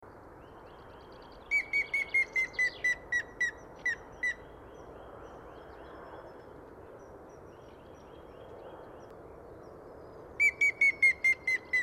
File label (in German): Grauspecht; Altheimer Stausee; Susanne Rieck